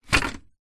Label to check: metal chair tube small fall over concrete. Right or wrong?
Right